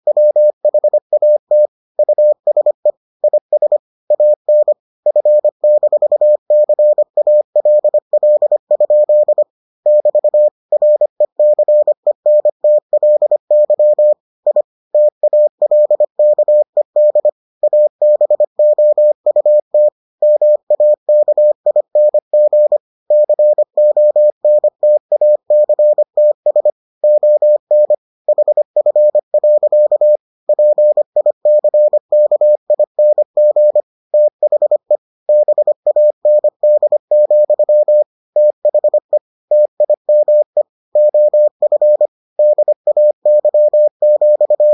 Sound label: morse